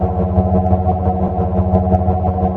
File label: dog idle mode loop, synthcrab; scmod